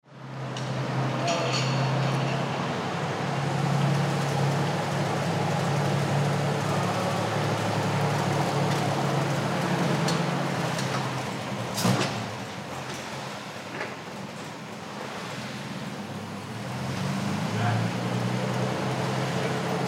Hangar Ambience Plane Inspection; Transportation Aircraft; Airports

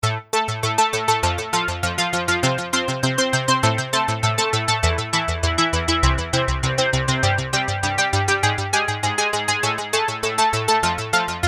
A Synthetic Space 100Bpm; Musical Sound Effects; Andrew Fleming

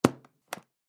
Poker Chips Case, Poker Chips Case Clip Open; Casinos; Sports; Casinos (Sound Effects); Blastwave

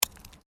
Digging Small Handheld Tool Rocks

